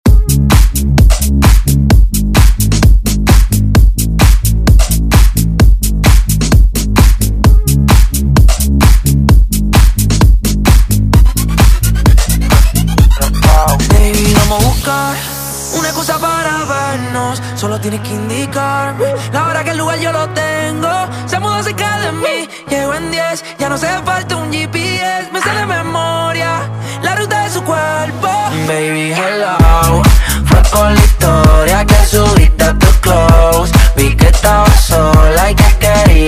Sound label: House Redrum Intro